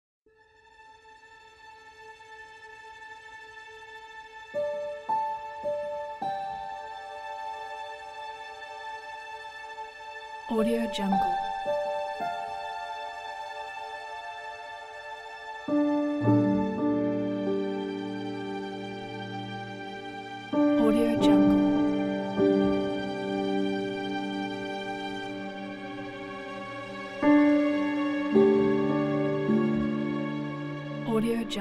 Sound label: background drama